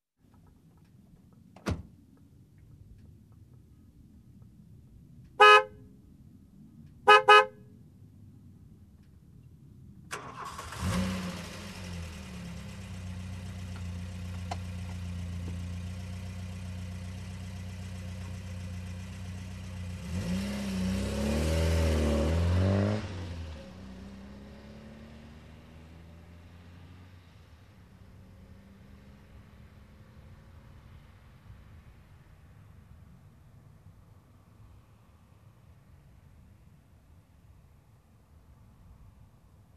Car door closing honking and starting

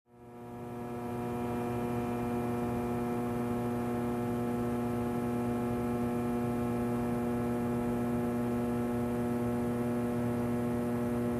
High voltage hum

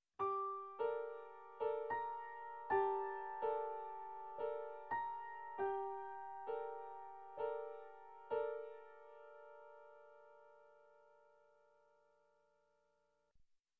Soft Suspenseful Piano Line

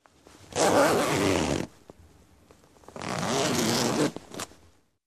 Open and Closing zipper

